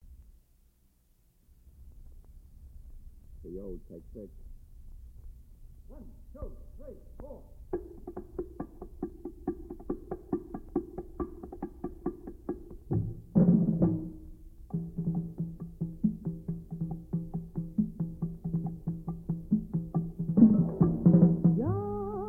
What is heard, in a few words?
Female Spanish Voc